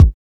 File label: reverb kick drum sound e key; kick drum samples